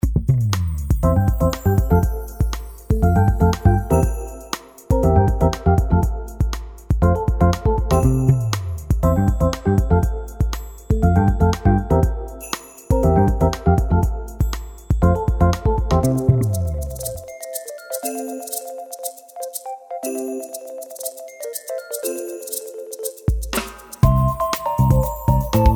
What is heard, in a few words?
game level